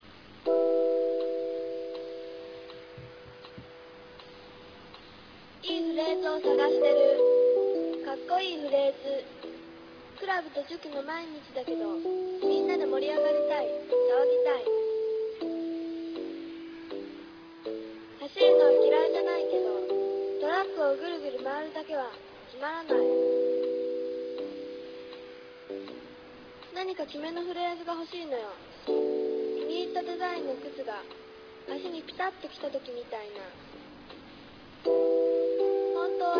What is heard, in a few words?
taiko